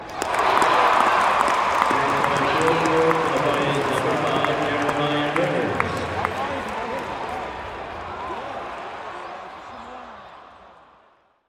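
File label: Arena Crowd Applause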